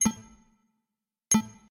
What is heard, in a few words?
Echo Blip